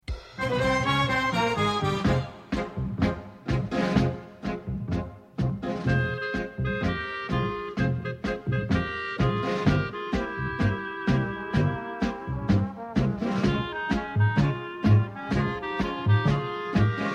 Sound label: Tango